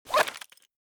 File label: pistol draw, weapons; beretta; Mask's Reanimated Anomaly Arsenal